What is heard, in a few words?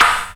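clap hip hop, drum kit; DRMKIT; E-Lab; X Static Goldmine 2; akai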